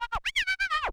Transform Med, vinyl record cuts longs

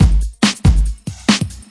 Loops BB 140, BreakBeats; Vengeance Samples

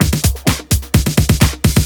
Fill Ins 128BPM; Vengeance Samples

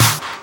snares; Vengeance Samples

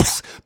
Beatbox Snare, Human Beatbox

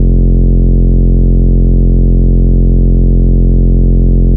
Root F, Bass

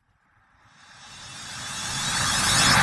Uplifter

